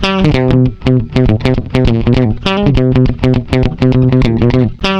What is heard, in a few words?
lick low; Gtr Chords&Licks; PlatinumPhattCD2; E-MU Producer Series Vol. 8 – Platinum Phatt